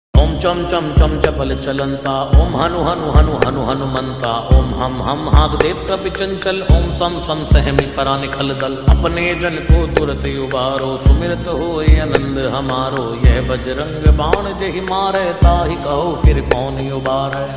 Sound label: Hanuman Mantra Ringtone